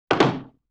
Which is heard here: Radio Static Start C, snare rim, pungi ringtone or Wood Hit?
Wood Hit